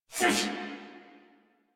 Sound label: Pain Crewmen, Battle Barks; Dialog; Warframe; Corpus Crewmen; Crewman C